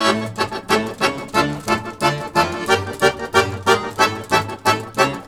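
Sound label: Balkanish Traditions accordion; USB Soundscan vol.63; AKAI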